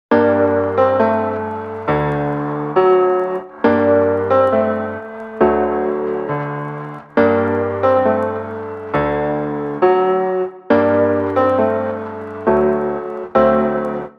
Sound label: Chords Lo Fi